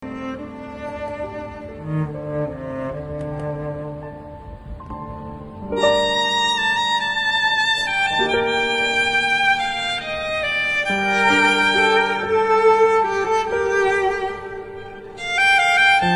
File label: violen insta; آهنگ بی کلام برای تولید محتوا اینستا